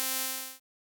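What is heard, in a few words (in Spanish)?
buzz sin formantes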